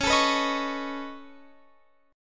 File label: Db 7th Suspended 2nd Sharp 5th Db, down, Guitar Open F; Db7sus2#5 strummed